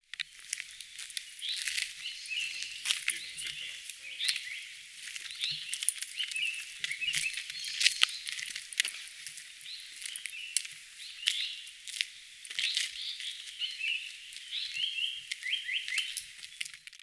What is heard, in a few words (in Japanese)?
sankoutyou, bird song